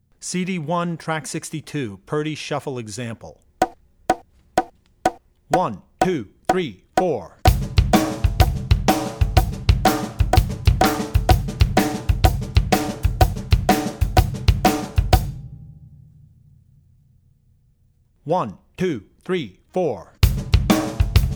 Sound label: Shuffle; Drummer's Bible Tracks; Purdie Shuffle